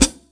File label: HH, Drum Dry; East Collexion; Drum 1 Dry